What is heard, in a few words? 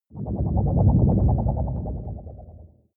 whoosh storm wobble hard; swooshes-swishes